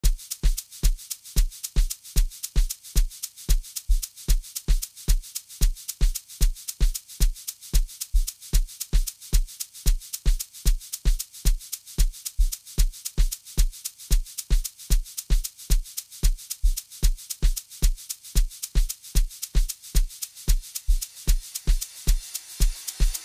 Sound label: Rainy Amapiano